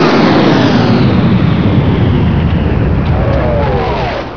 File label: wind down; tfc